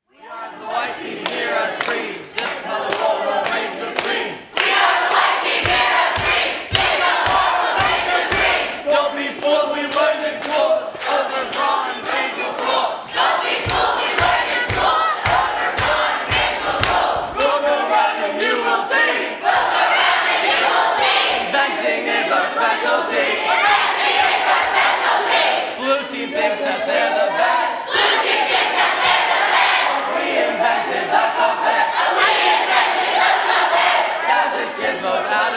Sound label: Cheer; White Team